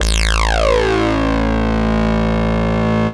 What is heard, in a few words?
saw lead synth